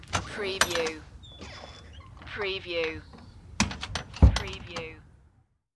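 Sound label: Open Close Front Door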